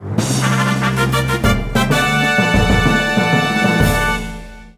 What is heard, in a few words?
Defeated; New Super Mario Bros. U Deluxe; Boom Boom